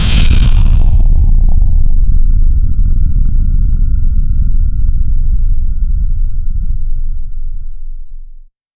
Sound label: energy